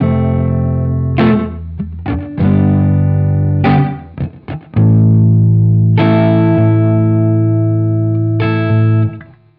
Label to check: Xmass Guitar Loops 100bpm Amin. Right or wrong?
Right